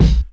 00s thumpy hip hop bass drum sample a key, Royality free steel kick drum tuned